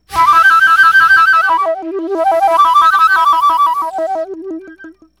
FLUTE FX; Greytsounds - Philip Wolfe David Crozier's Saxophones

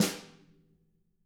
HIT, Drums; CAMCO

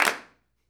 clap, Roland MV8800

